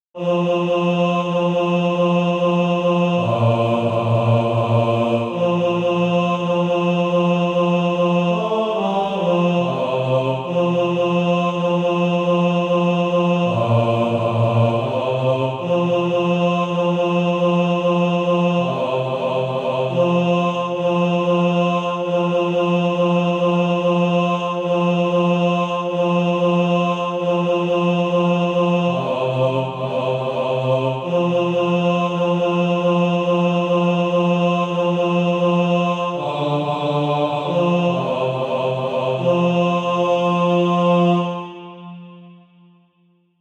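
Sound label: bass, Practise Music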